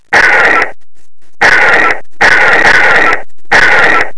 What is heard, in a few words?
weapon